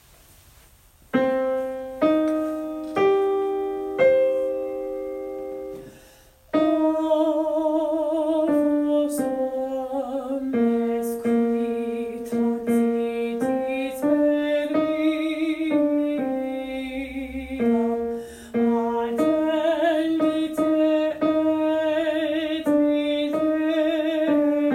tenor, choir; holy week choir practice tracks

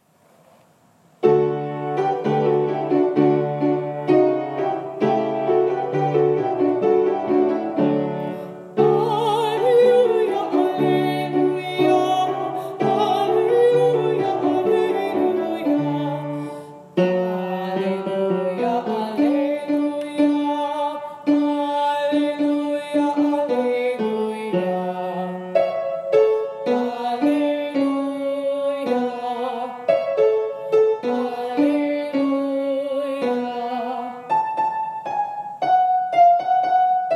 alleluia tenor, choir practice tracks; holy week choir practice tracks

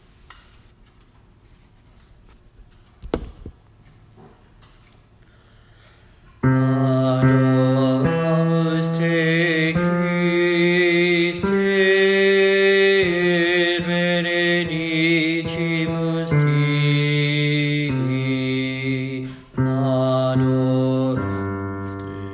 bass vocals, choir practice tracks; holy week choir practice tracks

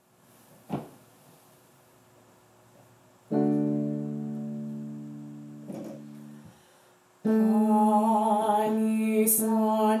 tenor, choir; holy week choir practice tracks

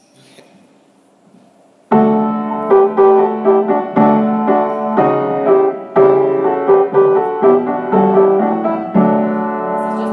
alleluia bass, choir practice tracks; holy week choir practice tracks

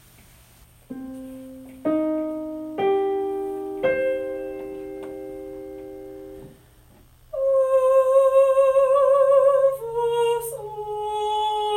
soprano, choir; holy week choir practice tracks

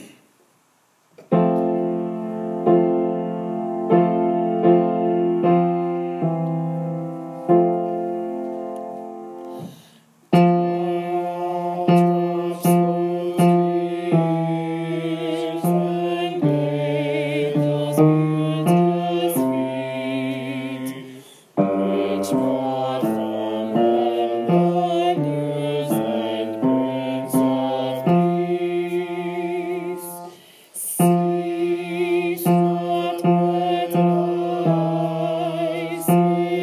bass, choir; holy week choir practice tracks

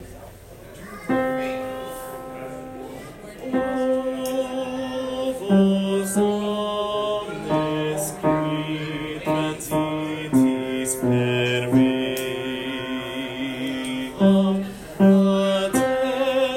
bass, choir; holy week choir practice tracks

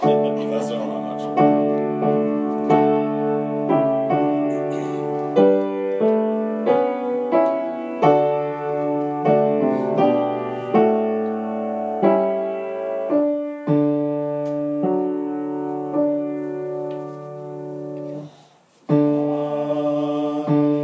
bass, choir practice tracks; holy week choir practice tracks

